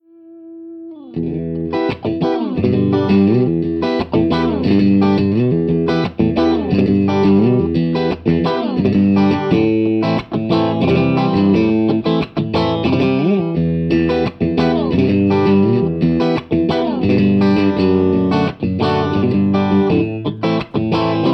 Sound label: Blitz 50 Head Fender Stratocaster